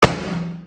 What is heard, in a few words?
slap echo